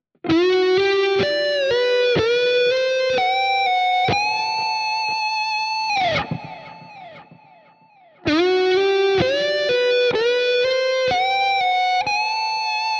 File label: AZ2207F lead all positions